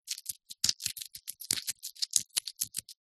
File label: human ear finger inside canal movements unblock